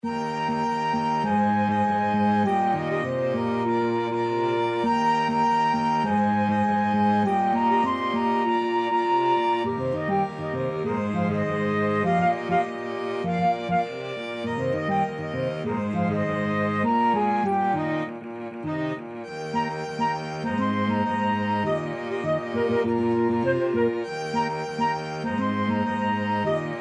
township toon, flexible mixed ensemble 4 players

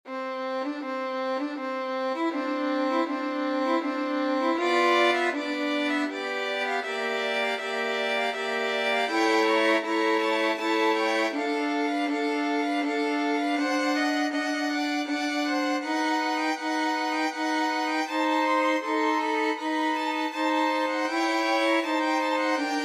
Adagio, violin; BWV 1005

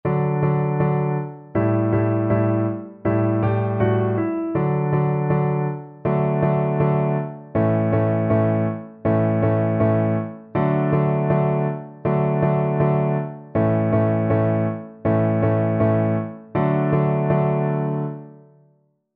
kakke kakke, Viola

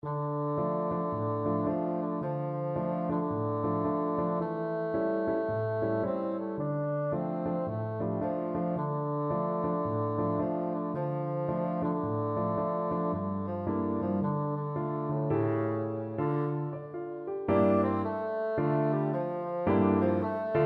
wade in the water, bassoon

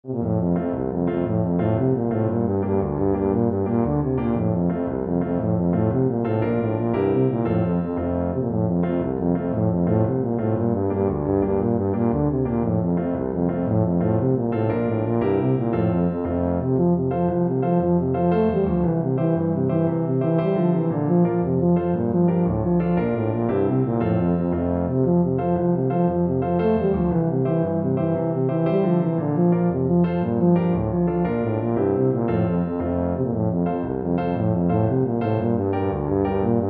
irish washerwoman, tuba

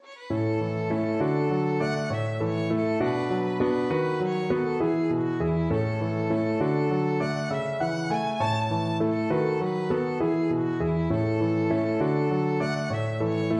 sir roger de coverley violin

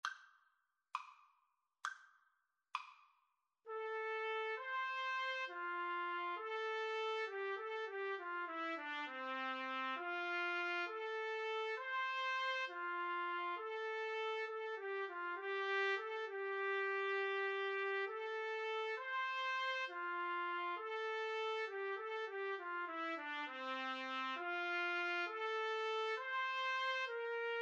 trumpet duet

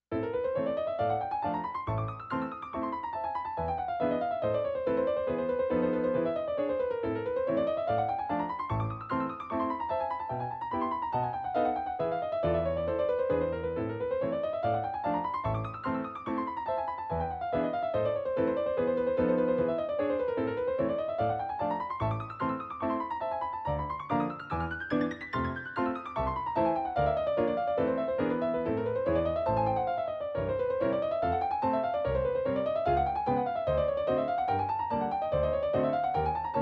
Etude, piano

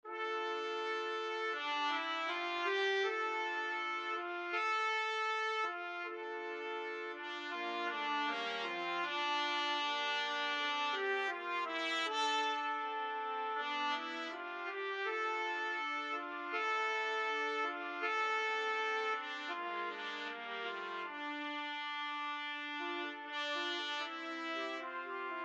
trumpet trio

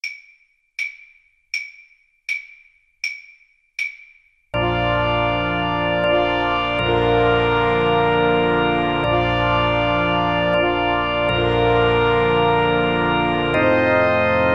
silent night alto, Saxophone

